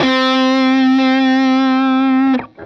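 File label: fuzz guitar vibrato; MAYFUZZ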